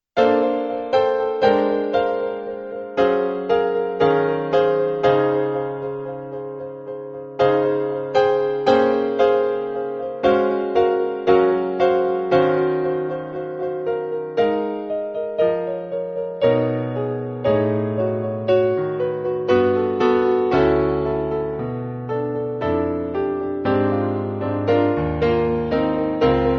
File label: chopsticks